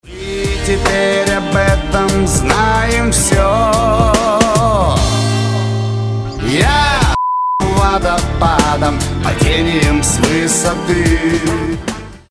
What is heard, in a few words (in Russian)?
Песня